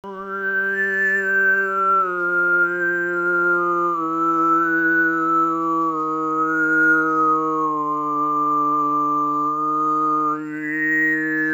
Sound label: Melody